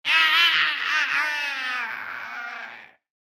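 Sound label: Bronzo Injured death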